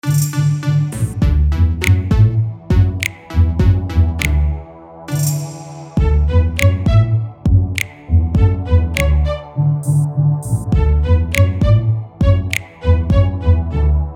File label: Video Background Music